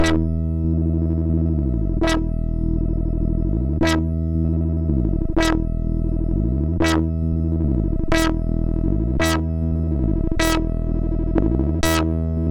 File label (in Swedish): Effekter, MOS6581R4; hvsc